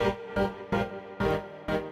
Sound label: SYNTH INDST 125 A, Industrial Keys